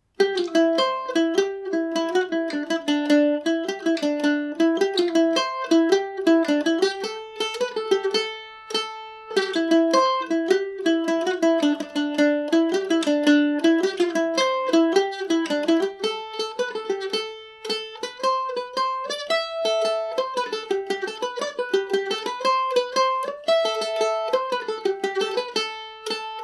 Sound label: jig, irish mandolin